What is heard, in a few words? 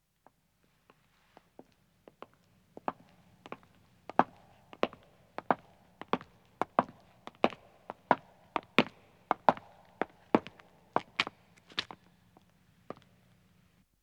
Stone Walking, 2 Men Approach, footsteps; BBC Sound Effects Library